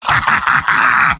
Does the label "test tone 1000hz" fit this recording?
No